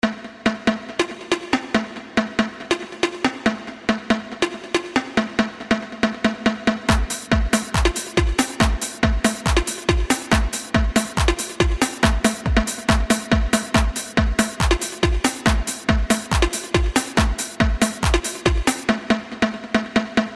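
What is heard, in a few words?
bass call ringtone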